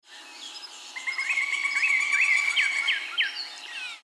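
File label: Pileated Woodpecker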